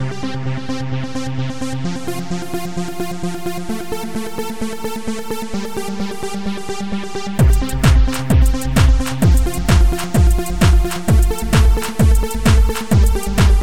Trance Version

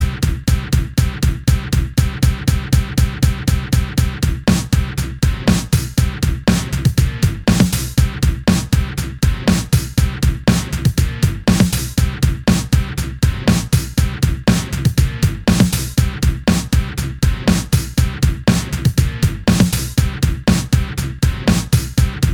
Guitar Drive

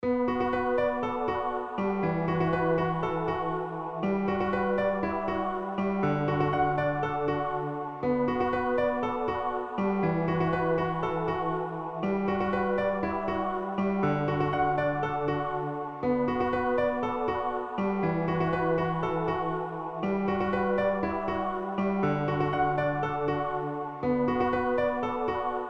Instrument Heaven Piano

